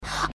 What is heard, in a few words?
breath; pony; Twilight Sparkle